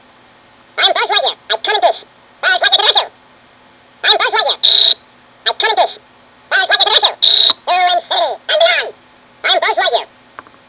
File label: buzz